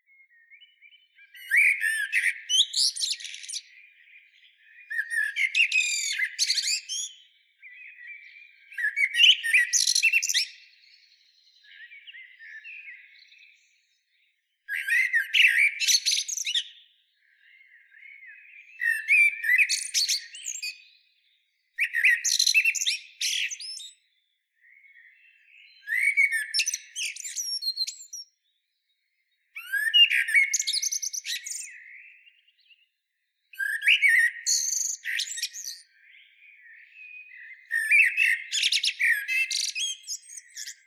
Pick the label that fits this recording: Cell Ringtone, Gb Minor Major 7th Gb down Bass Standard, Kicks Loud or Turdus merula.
Turdus merula